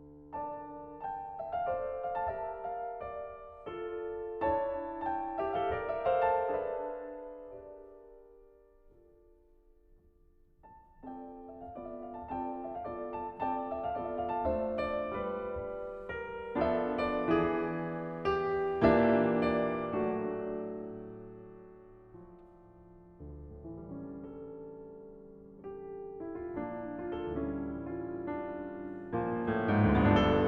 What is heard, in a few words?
Norwegian Folk Songs, Cattle Call, Piano; Norwegian Folk Songs, Op. 66 VIII. Cattle Call; Grieg Complete Piano Works